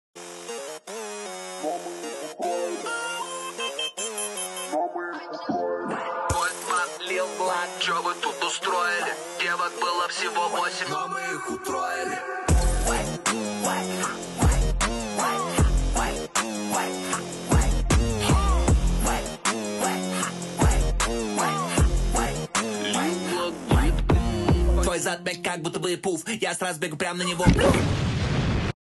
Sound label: remix